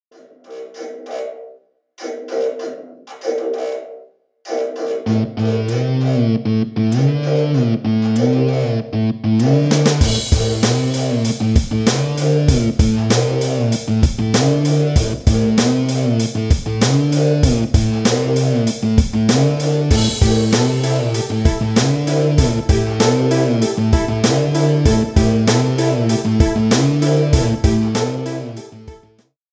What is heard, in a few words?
97bpm remix